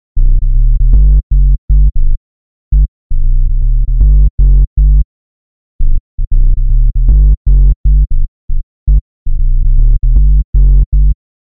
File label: sub bass; emu mo phatt